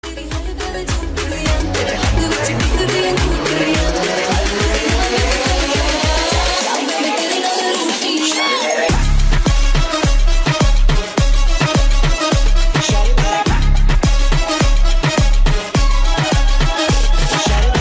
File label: bollywood mix ringtone